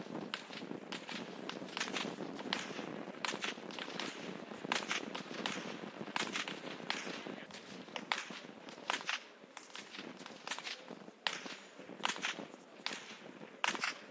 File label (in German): schnalzen, jugend; kirchanschoering; waging